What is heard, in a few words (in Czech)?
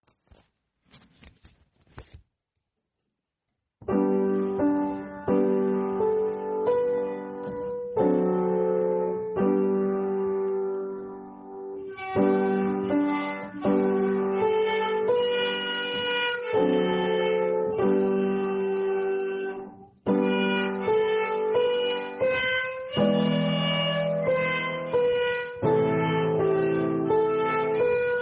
housle a klavir